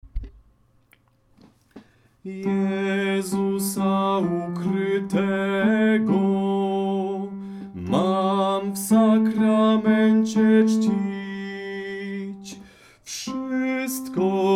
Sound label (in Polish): tenor; Jezusa ukrytego